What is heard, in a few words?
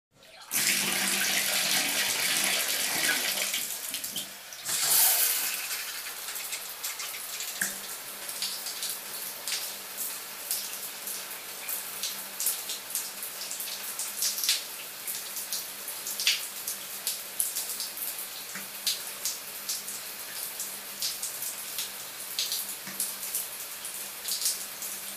Shower Water W Heavy, splashes